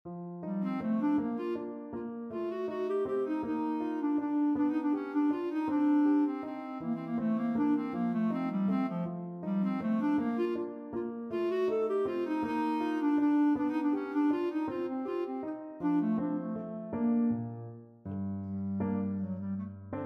scherzetto, clarinet; karganov

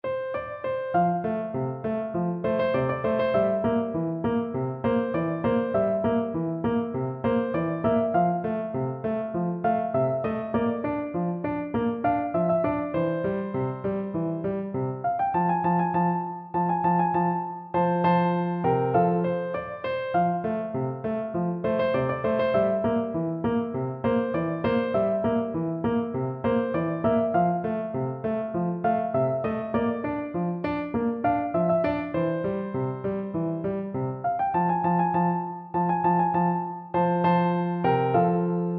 piano

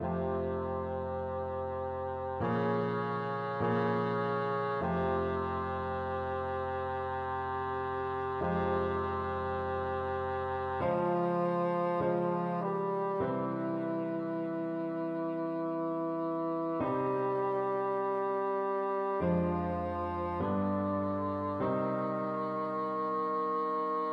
bassoon

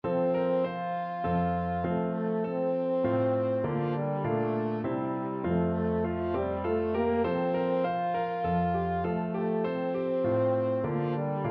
minuet g, french horn; Telemann

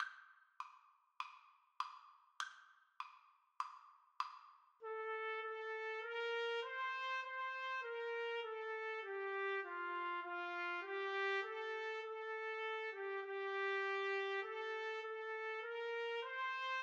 trumpet duet

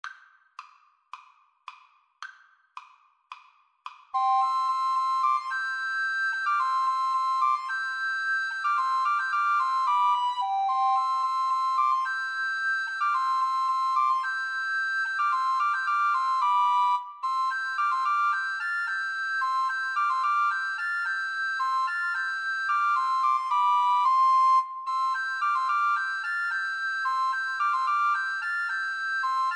Recorder Trio

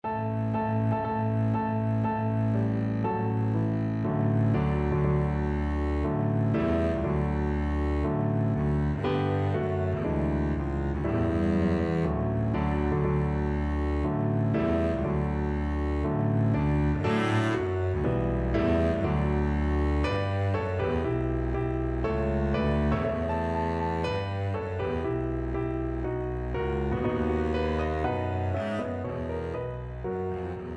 wagner wedding march, Double Bass

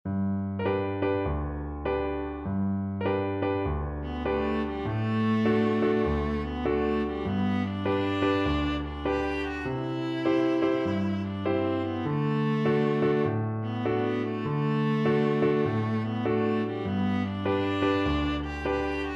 viola

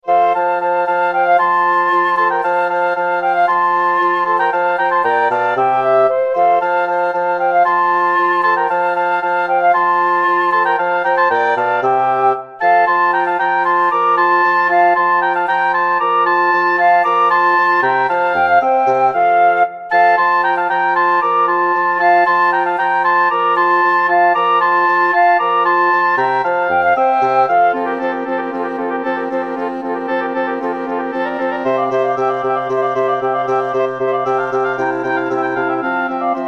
flexible ensemble, 4 players

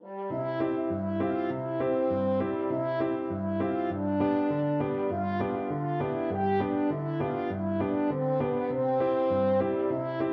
rosa arredonda, french horn

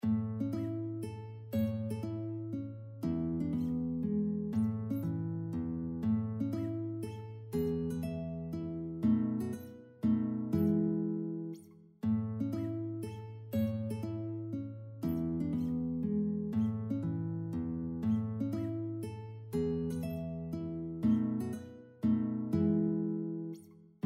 waltzes, guitar; aguado; 12 waltzes no1

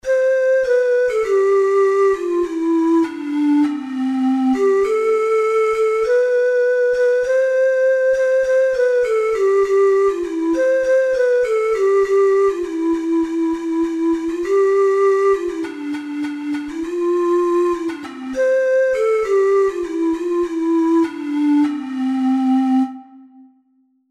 ocarina four hole

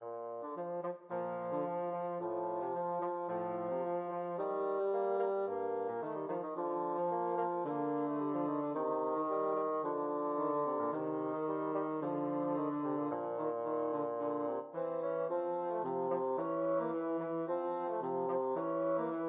bassoon duet; schubert sym 5

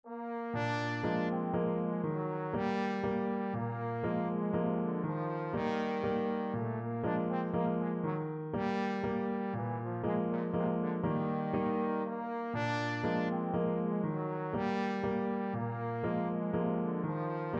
waltzes, trombone

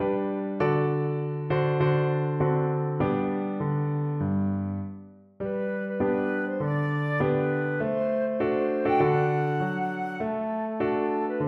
flute

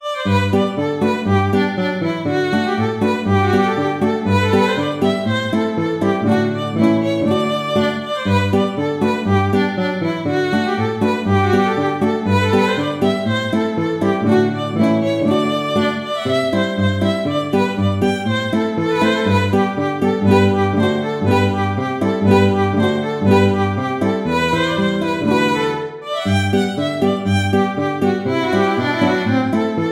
Quick March, violin guitar; by Mr. Handel

